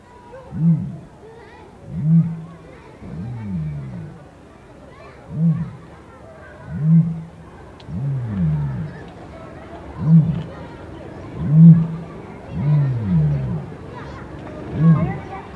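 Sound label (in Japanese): dachou, ダチョウ 鳴き声; 繁殖期にはなわばりを防衛するために、首をふくらませて、大きな低音を出します。; 体高約2.5メートル。鳥の中ではもっとも大きな種です。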